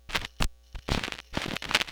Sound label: Record Noise, Vinyl Record